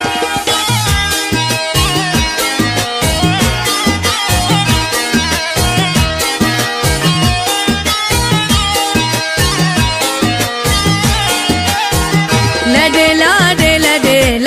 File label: omani balochi, ringtone